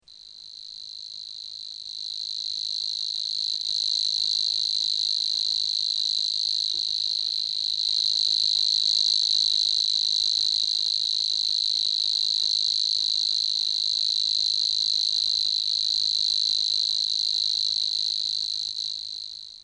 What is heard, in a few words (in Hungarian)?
Nбdi tьcsцkmadбr; Madarak